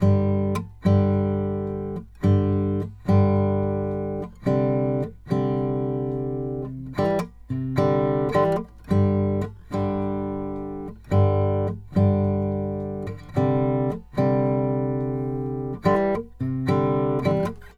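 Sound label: Country Guitar, Verse 1+2; Digidesign; Pro Tools Essential Loops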